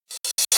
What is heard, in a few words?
Dr reverse